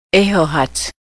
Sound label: laugh